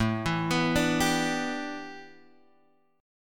A 7th Suspended 2nd Suspended 4th A, Guitar Open G; chord